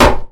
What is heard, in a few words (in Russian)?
odinochnij spokojnij udar v dver